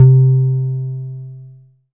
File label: Dance Floor Bass, Multisample Basses